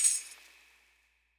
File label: tamb; eddyflux